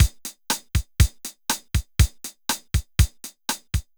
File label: Loop, 505; classic machines